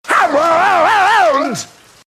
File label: HaMmOnD